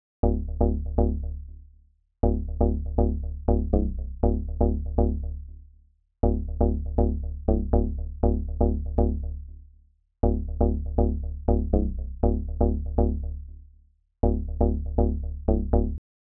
120bpm, Short Stab

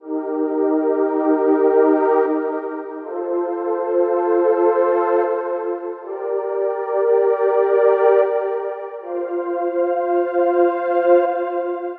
105 synth pad D-; golden

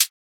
Hat

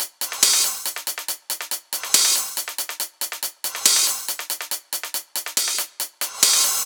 top loop, 140; charged

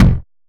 perc

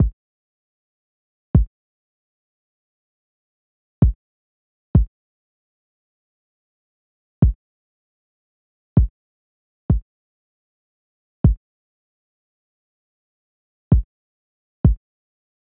117 Fm kick

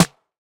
aah snare one shot mid short

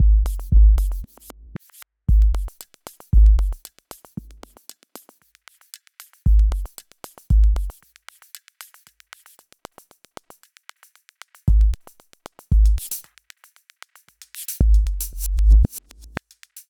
115 Drums

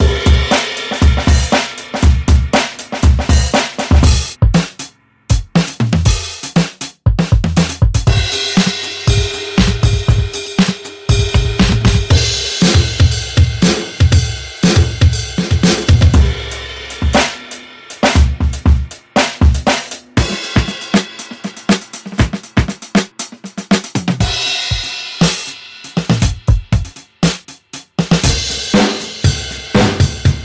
Vintage Drums; Noiiz

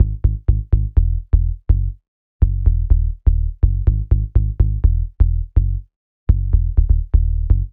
124 B Syn Bass; Pyra

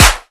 clap

